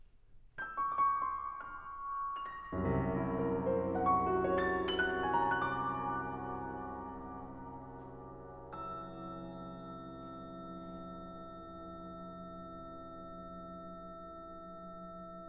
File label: bells; Shadow of bells stereomix